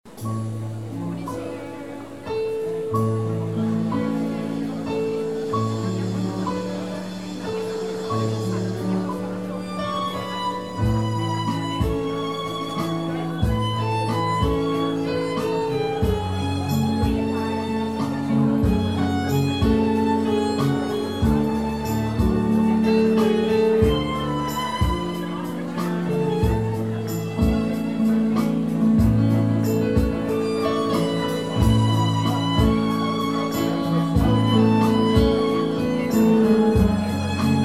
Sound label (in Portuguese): Piano e Cordas